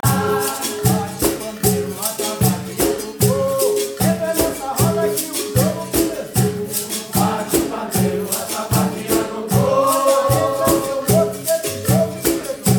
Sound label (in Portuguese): Bate o pandeiro atabaque e agogo; Guc i Bergen 2013; ble spilt inn på vårrodaen i Bergen i 2013; Ginga Arte - GUC 2013